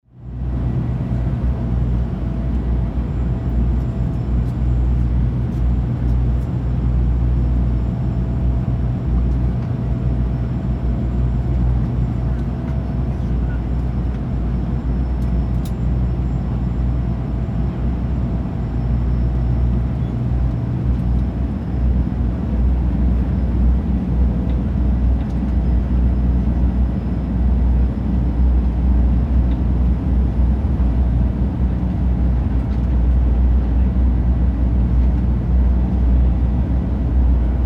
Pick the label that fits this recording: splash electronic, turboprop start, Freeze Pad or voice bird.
turboprop start